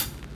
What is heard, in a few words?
drumbreak hihat